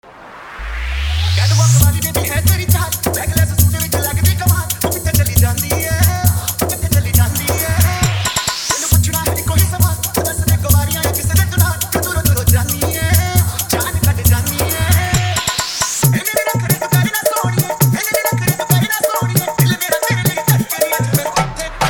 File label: Hindi Dj Remix Songs High Bass Mix